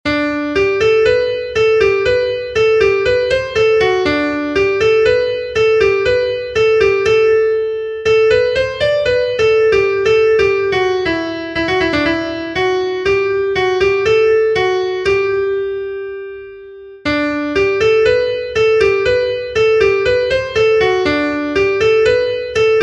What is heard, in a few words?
Anglaise